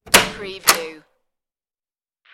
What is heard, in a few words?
old door open